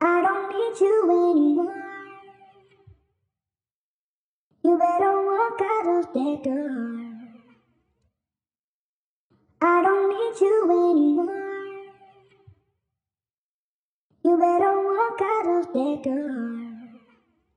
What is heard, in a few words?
Vocal - 100 BPM G Min; Cymatics; Orchid Sample Pack; Vocal Loops